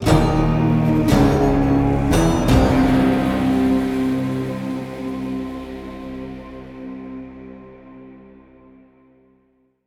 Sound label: Theme Stinger